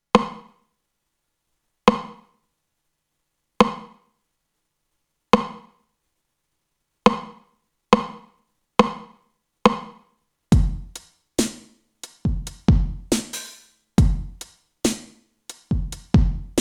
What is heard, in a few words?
tr909 reverb